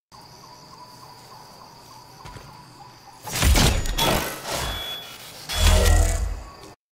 npc noise; Fortnite

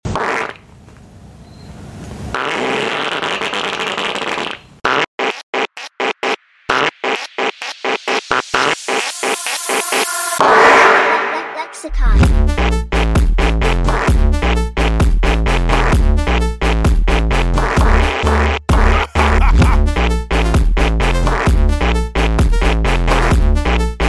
Funky farts

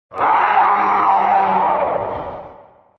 zombie yell; minecraft mutant

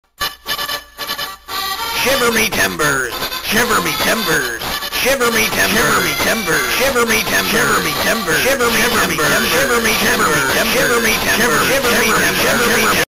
piratecore meme song

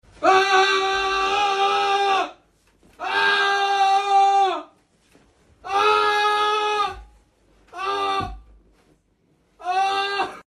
guy screaming; made with Voicemod

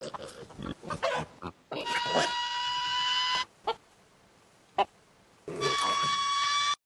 Rooster Screams AHHHHH; Crazy; Meme Sound Effect

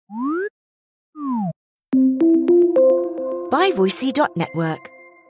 game sounds; Animal Crossing

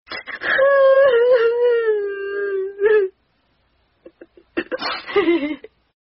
Girl fake Crying Meme sound

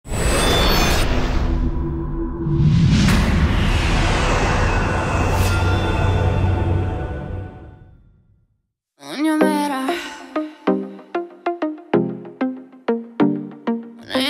albanian music

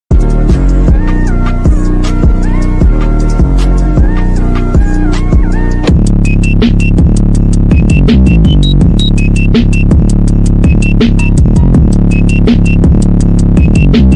phonk

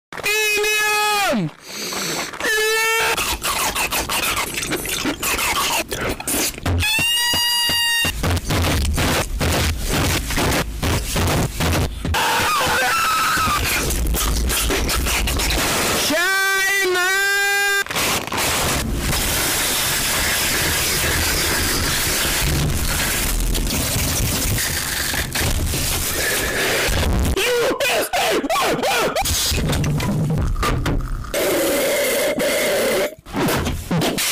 melon asmr relaxing sound for sleeping; Meme

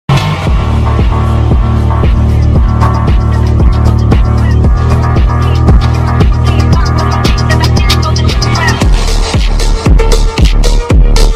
PHONK REMIX

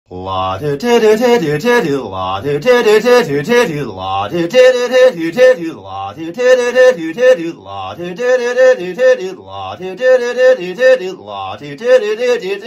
frat boy singing bach

